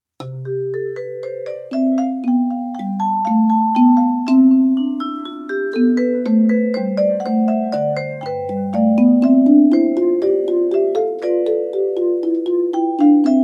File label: Invention No. 1 in C major BWV 772